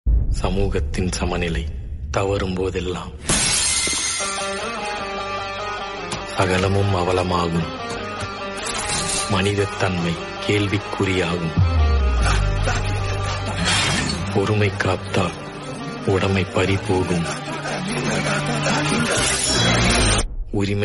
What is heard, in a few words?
bgm theme; uriyadi